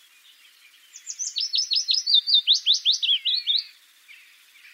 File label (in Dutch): fitis